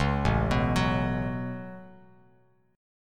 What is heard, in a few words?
A 6th Add 9th A down Bass Standard; A6add9; arpeggiated